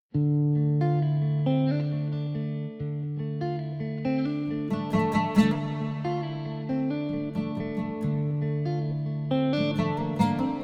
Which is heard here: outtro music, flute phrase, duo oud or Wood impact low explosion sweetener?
duo oud